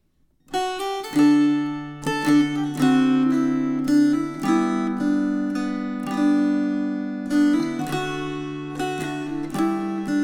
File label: String Choir